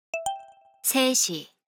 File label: Hourly Alert; SKY IM-U680L